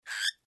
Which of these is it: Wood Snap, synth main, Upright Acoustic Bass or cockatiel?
cockatiel